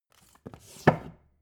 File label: book shelve